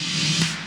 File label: hit 68 C; ProSamples vol.34 - Hip Hop & RnB 3; Slow Wined